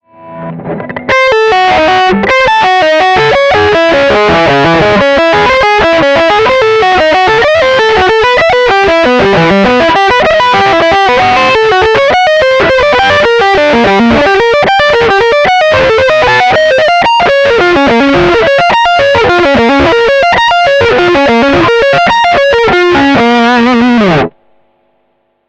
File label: Appergio